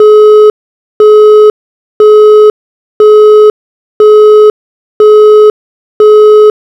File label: Busy, phonetones